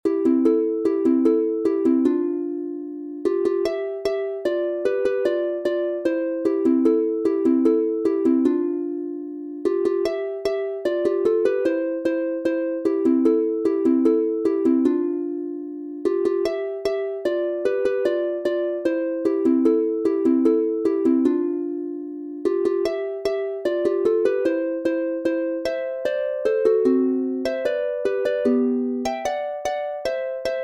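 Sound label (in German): Polka C Dur 2 stimmig instrumental, Harfe; Volksmusik aus Bayern; Mei Kunnala is schoei dick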